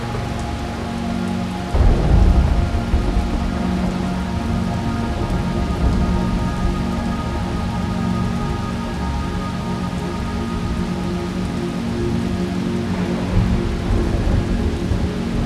Orage Ambiance nocturne Rolling Thunder

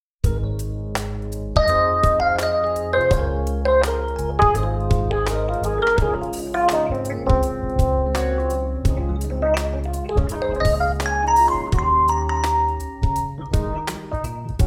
jazz d ambiance club

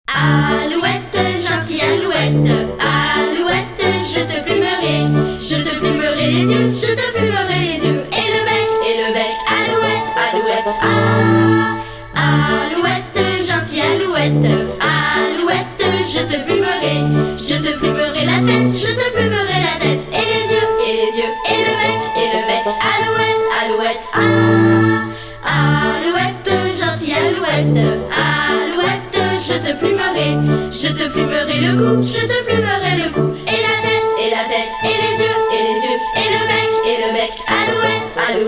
La chorale des petits anges, Alouette gentille alouette

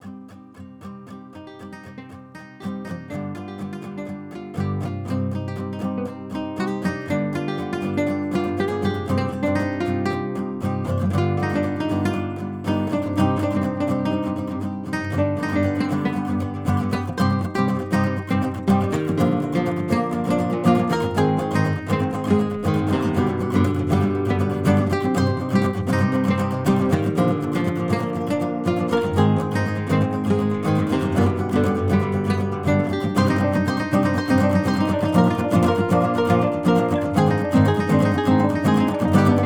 Guitare

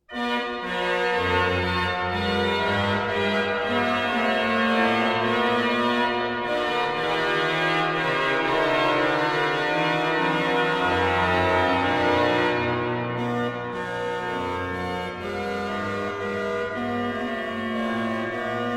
orgue, Suite en Ut, Grand jeu

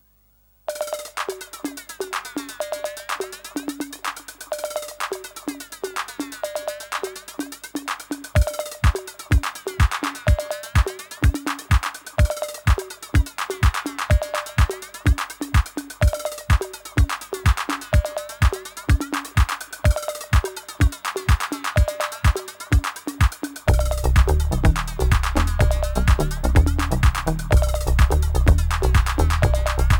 Orgue Electronique

